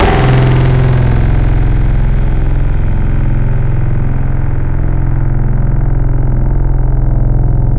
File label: Futuristic, Tropical Mallets